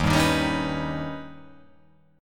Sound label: D sharp Augmented 7th D sharp